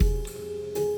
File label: Ride, Drums; BB 2HTC Samples Vol 4